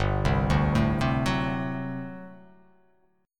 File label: G Major 7th Suspended 2nd Suspended 4th G down